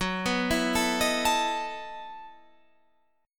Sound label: E Major 7th Suspended 2nd Suspended 4th